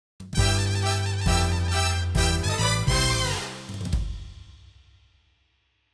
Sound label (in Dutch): Triomfmuziek; Mees Kees